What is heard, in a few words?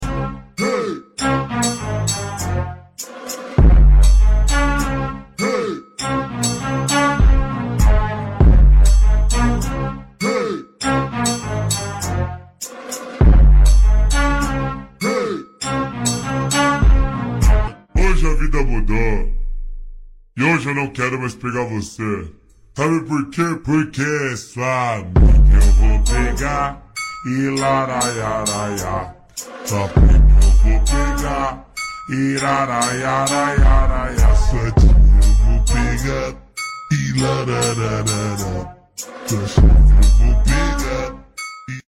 FUNK Slowed